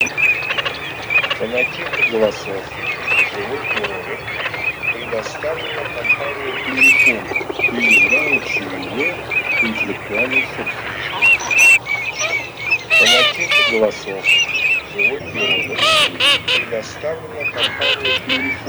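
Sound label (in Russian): Чирок-свистунок Alzavola, манок; plurifon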